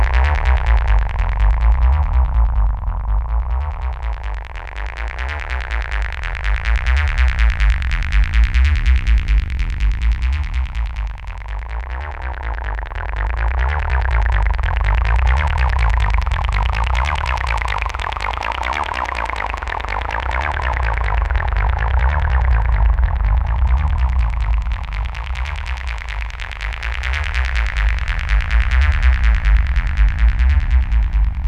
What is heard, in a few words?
high res VCAs modded by 4 LFOs; analog synth; quad timbre bank